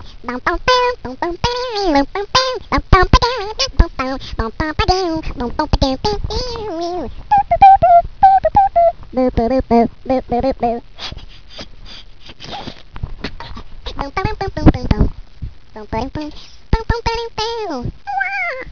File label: master beat